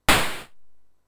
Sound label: Plok!; lemmings atari st 1990